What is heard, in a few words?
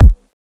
low tr 505 analog drum kick b key; Duration 0:01; kick drum samples